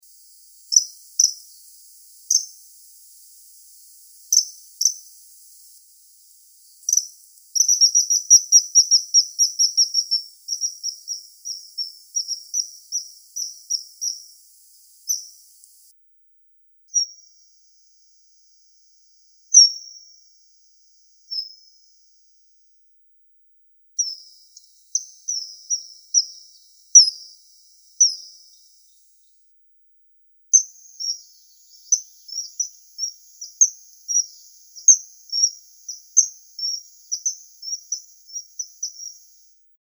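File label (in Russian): Zimorodok, common kingfisher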